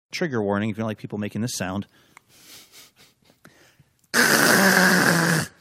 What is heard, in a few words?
trying to clear throat itch